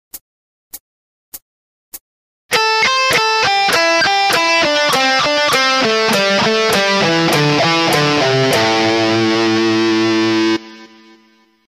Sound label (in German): uebung, penta